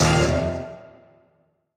anim Scatter; whos the bride

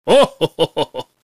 santa Medium Win; jingle spin

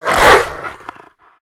spreading Wild Animation; the wolfs night

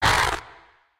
snort; the wolfs night

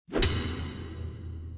picker Catch; fairymirror

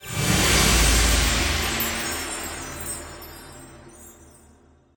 super Mega Win Text Change; temple of nudges

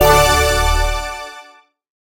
win medium drop; dazzle

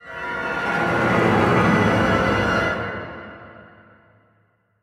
near Win; the wolfs night

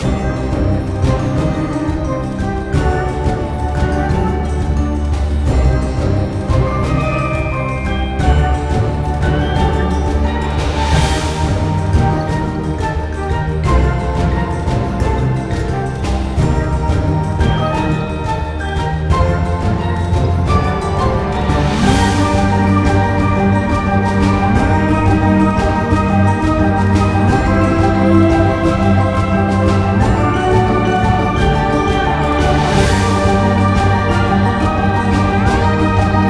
freespin; fairymirror

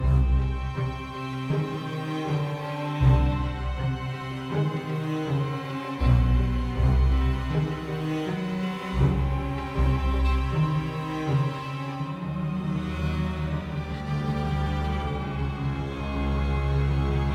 intensity; the wolfs night

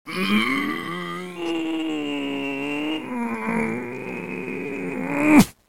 santa Pulls Hard; jingle spin

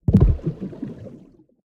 quick stop; reef raider

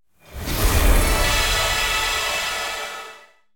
random Symbol Transform; fairyhansel

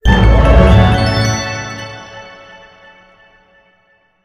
large Win Tonal A; fairymirror

